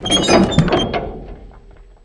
door metal open

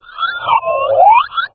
alert, houndeye

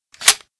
m203 out

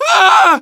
medic pain severe; tf2

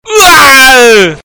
ooo; pvplite

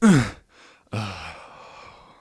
Morphine Injection; police

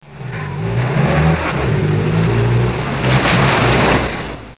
car sound

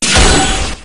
razor fire; ut99

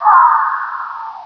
quake death

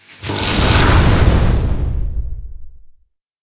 ball attack

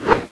knife stab miss

